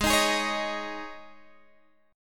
G sharp Suspended 4th Guitar Drop D chord, down

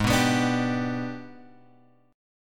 G sharp Minor Major 7th Flat 5th, chord; G#mM7b5 Chord {4 2 X 4 3 3} strummed

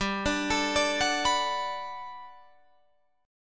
G Major 9th, G, down, Guitar, Drop D

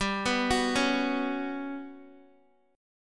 G Major 7th Flat 5th, Guitar; Listen to GM7b5 arpeggiated

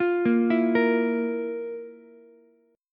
F Major 7th Suspended 4th F, Ukulele; FM7sus4 arpeggiated; JGuitar

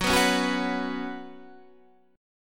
Gb Suspended 4th Gb, down, Guitar, Standard; strummed; JGuitar

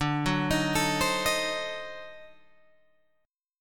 D 7th Flat 9th D down Guitar Open G

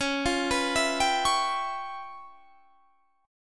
Db 7th Sharp 9th Flat 5th, Guitar, Standard; Db7#9b5 arpeggiated; JGuitar

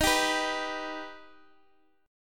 Eb Augmented, Guitar Standard; strummed; x,x,x,8,8,7

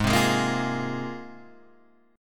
G sharp 6th Add 9th G sharp Guitar Standard; strummed; capo=3

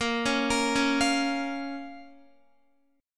A sharp Minor A sharp down Guitar; arpeggiated; JGuitar

